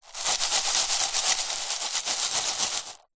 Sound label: nature ice crushed pieces shake